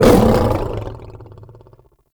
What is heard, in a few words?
Cast Impact Vocal; Warframe; Uriel; Remedium